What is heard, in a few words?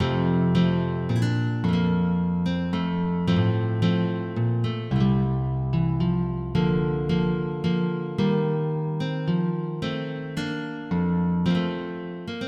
Sarabande, MIDI